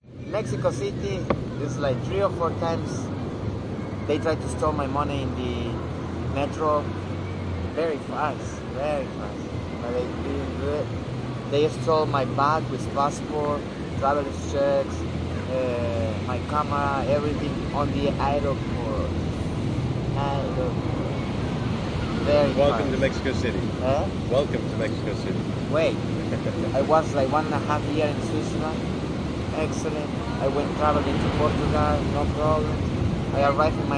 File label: rogue wave; Sound FX Real Life